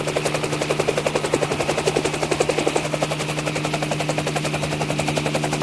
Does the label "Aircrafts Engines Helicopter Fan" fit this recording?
Yes